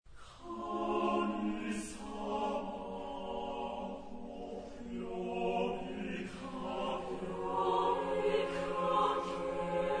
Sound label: Tempestoso, chorus; Matsumoto Nozomi